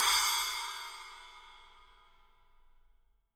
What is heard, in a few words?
8 SPL 21, FX CYMBALS, Living Drums; Sampleheads; Peter Erskine Living Drums